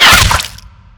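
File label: physical bullet impact flesh, violence; Benny Placeholders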